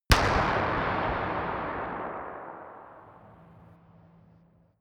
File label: gun dark pop gun; schusswaffen